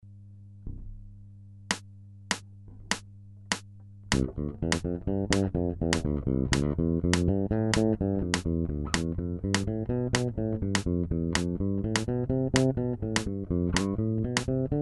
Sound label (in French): quintolets de croches